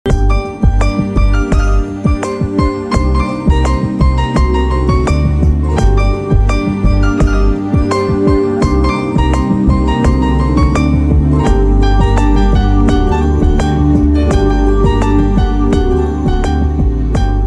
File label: bgm music; 7g brindavan colony